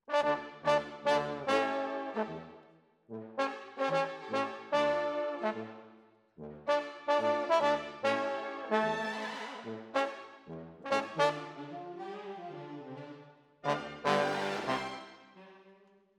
Trombones, jazz for piano

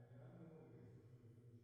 Piano 480

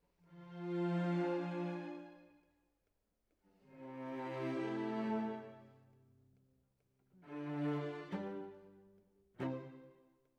Cellos

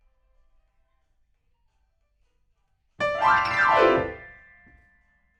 Piano, RNR for piano

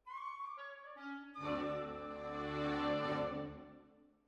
Hall, Jazz for piano

